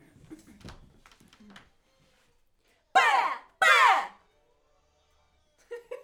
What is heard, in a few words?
Backs AKG